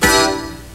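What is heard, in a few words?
KEYBD, keyboard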